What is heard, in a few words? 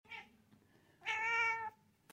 cat meow